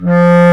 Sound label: WND CB.CLA; Roland - LA Composer vol. 1